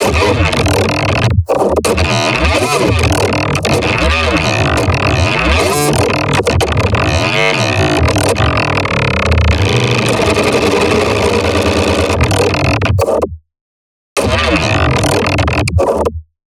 Neuro, bass

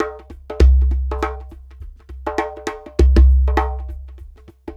Djembe; Ambient Grooves; World Groove

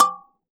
oxaca side, percussion; ILIO

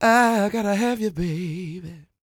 croon, Vocal Planet Blues Soul; Spectrasonics

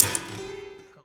Prepared Piano; Battery 3 Sample Kits

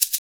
SHAKER, Modern Percussion Loops; Soundscan vol.56; AKAI; SHAKER133

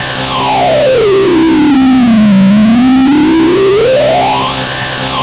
BLOW ZWEEP; Distored Reality

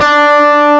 D3, GUITAR; AKAI S-Series CD-ROM Sound Library VOL-8

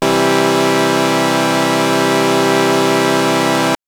Synth Sync maj c2; TrashLibrary

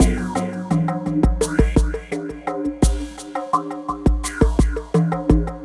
DJEMBE FRAME MACHINE COMBO; Sonic Foundry (Sony Creative Software); Vir Unis Infusion

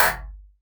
Kaut, One Shots, Tik; Sonic Foundry (Sony Creative Software) - Future Retro Dance Excursions

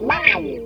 GTR WOW, Funk Gtr & Wah; PlatinumPhattCD2; E-MU Producer Series Vol. 8 – Platinum Phatt

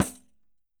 Roland MV8800 percussion; MVPERCUSSION

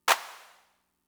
Clap; Fricke MFB-522

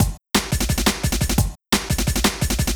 DNB BREAK

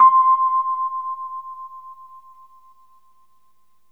Rhodes fast C; 3D Sound; 3D Keys VOL-1

